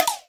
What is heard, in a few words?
strike